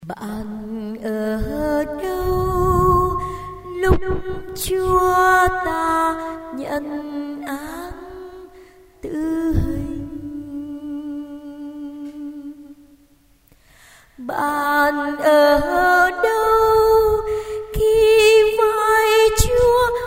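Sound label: soprano alto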